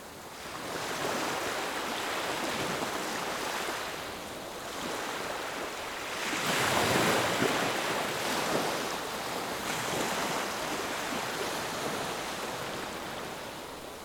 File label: Calm waves Sand beach